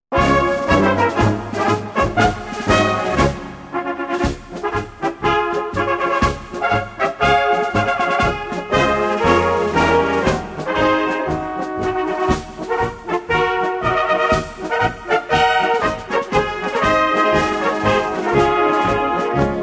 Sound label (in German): Marsch